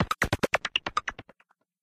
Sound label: Beat Box Android, notifications; LG Optimus One P500